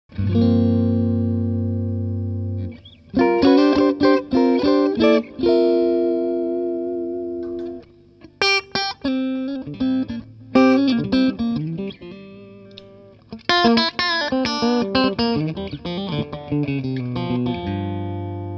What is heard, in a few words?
Clean